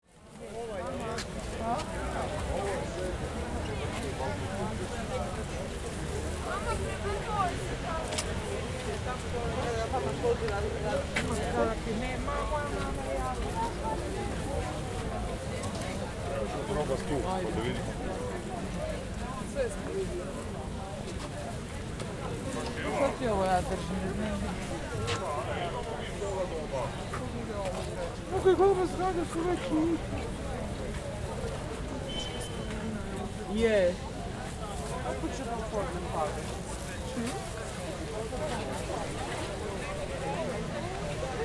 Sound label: Busy market area people walking and talking